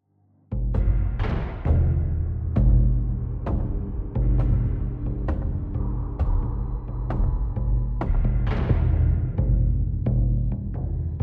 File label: Tension; The Last of Us; AC Tension 4 30 Seconds Left